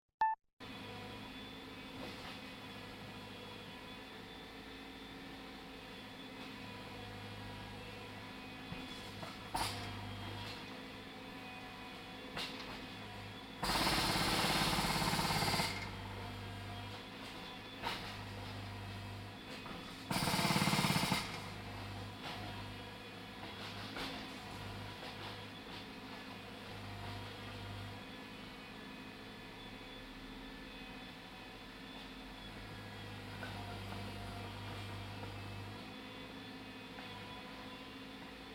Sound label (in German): presslufthammer